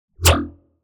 shox, bass

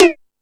Hip House, Drum Machines E-MU Pro-Cussion

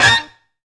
JET HIT; Zero-G; Funky Elements

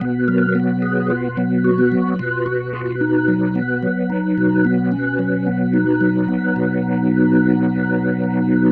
Psychedelic, Organ, Loops

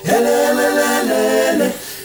HELELELE, Heart of Africa, CHOIRS; Spectrasonics; Heart of Africa 2

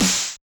Yamaha DJX 2B, SD T9 Dist L

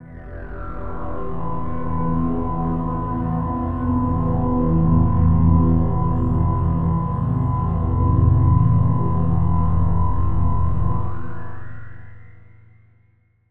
Dark Synth, cinematic drama soundbeds; musicradar

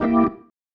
Stab One Shots, Organ

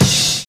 END ROLL; Univers Sons

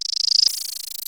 RATTLE

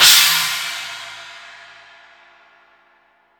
CHINA, Drum Dry; East Collexion; Drum 1 Dry

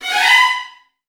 VN FX, Virtuoso Strings

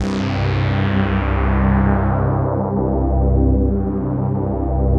SYN K-2000, Roland

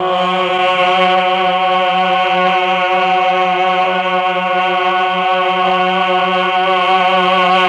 CHOR; ILIO; Propeller Island Legendary M400

